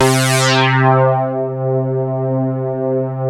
LEADS; Masterbits; Vintage Voltage

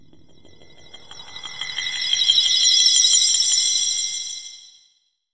CRYST, INTRO; AKAI Anime Sound FX

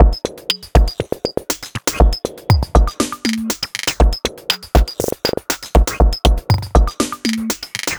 Beat, Drum Loop; Bot Bent Beat; Sonic Foundry (Sony Creative Software); The Electronic Music Manuscript Collection; Drum Loop Structures

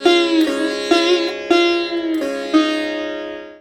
SITAR LINE, SITAR MELODY

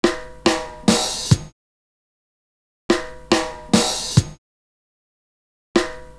simple break, loop; Univers Sons

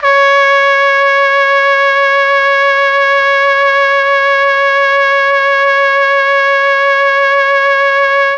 C#3 TRUMPET, Mellotron; Mike Pinder - Mellotron Archive CD

